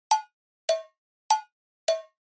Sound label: Percussive Accent Slow; 打楽器アクセント